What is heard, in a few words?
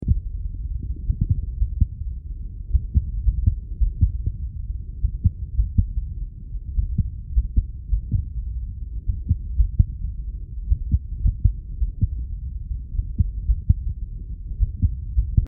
ISOLATED 2ND DEGREE AV BLOCK, Heartsound; Heartsound Library